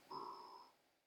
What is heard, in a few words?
croak, frog